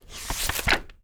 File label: TURN PAGE, READING BOOK; AKAI S-Series CD-ROM Sound Library VOL-6